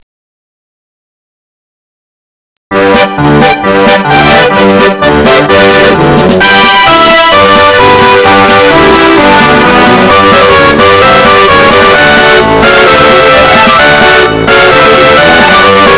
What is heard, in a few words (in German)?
blasmusik; de bavariancastle